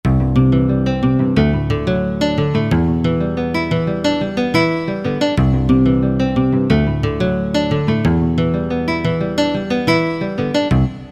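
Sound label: guitar Nylon, E-mu PK-6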